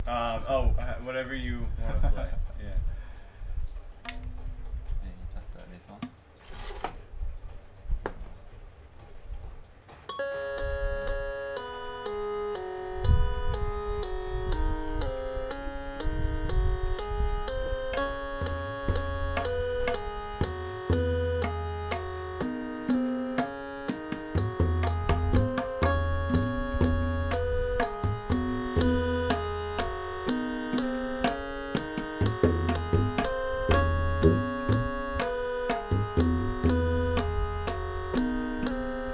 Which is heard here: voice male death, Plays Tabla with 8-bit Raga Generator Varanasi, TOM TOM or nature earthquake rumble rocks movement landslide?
Plays Tabla with 8-bit Raga Generator Varanasi